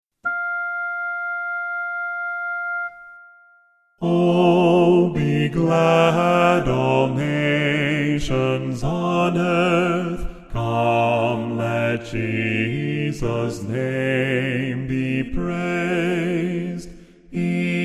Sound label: o be glad bass